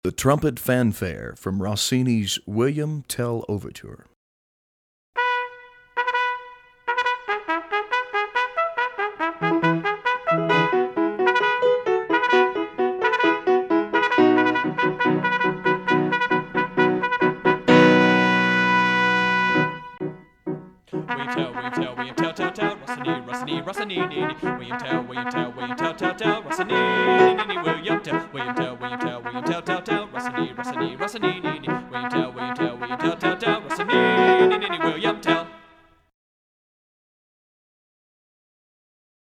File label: William Tell Overture Trumpet Fan; Rossini